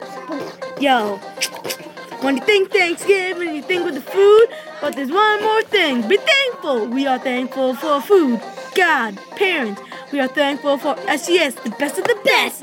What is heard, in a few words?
rap